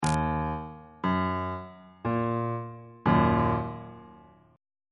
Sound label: e flat minor chord